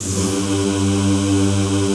ZZZ G1, Classical Choir; Best Service; Extended Classical Choir

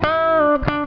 FUNKY, Grooves

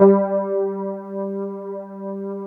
WET RD G2 L, Keyboards Old School; USB Soundscan vol.09; AKAI; WET ROAD

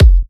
F, kicks; markus hakala kicks

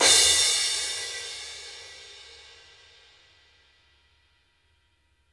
CRASH, Drumworks; Sampleheads - New York City Drumworks VOL-1; SW KITS

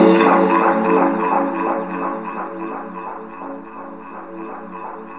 DUB DEEP PAD

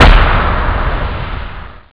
power tom; jazz jackrabbit 2; M8

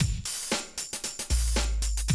Classic Drum Loops

